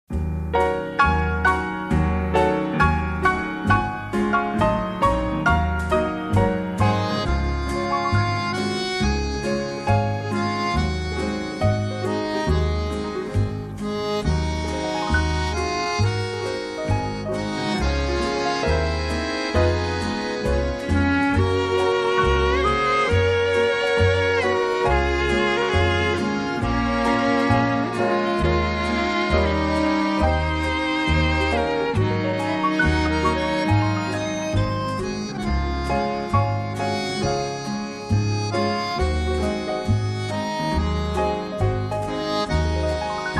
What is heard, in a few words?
accordian band